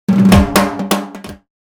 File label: Drum Hit Sample; I'm A Celeb